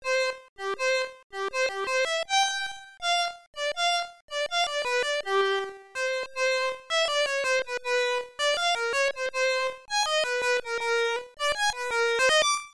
Violin; doukyusei pc 9801

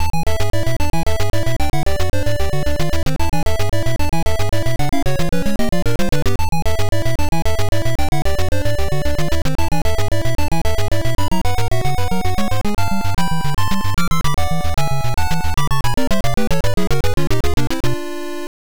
Bonus Stage BGM; Vs. Excitebike; FDS

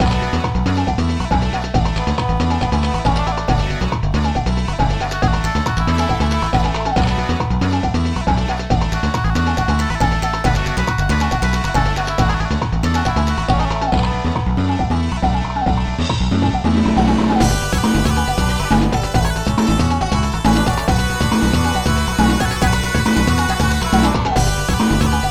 BGM DUNGEON; Izuna Legend of the Unemployed Ninja; Nintendo DS gamerip

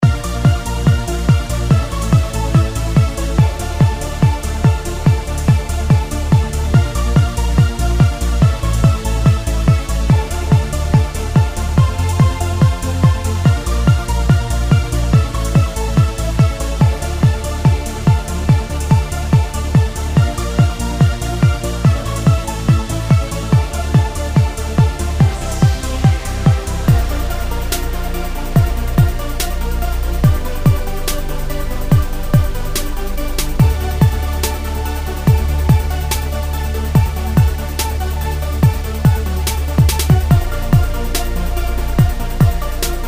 buzz

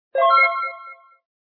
Sfx Achi Unlocked; nyan cat lost in space

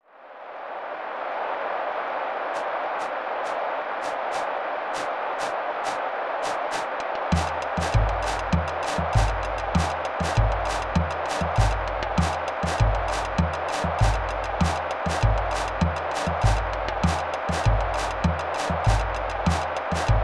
Soccer Game Ambience; J League Soccer Prime Goal 3; SNES

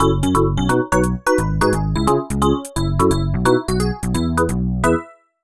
Goal In; Bubble Bobble also featuring Rainbow Islands; Saturn

